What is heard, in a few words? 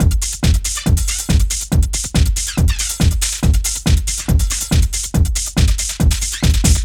DRM, LOOPS 140, House